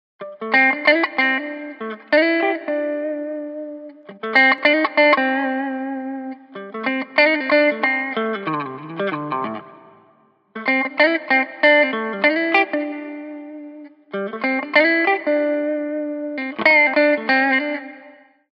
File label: watery chorus guitar dry